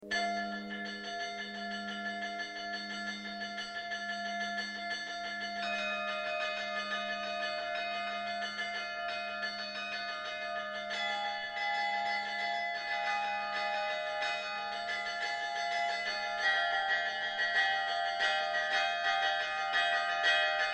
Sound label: cycle for carillon Solitery Bells